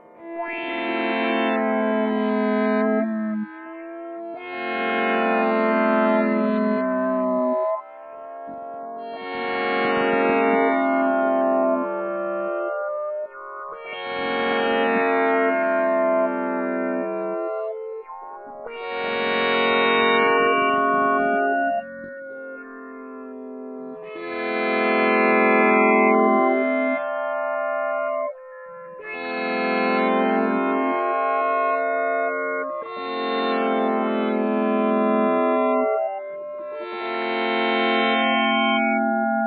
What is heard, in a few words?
sinewaves soundtrack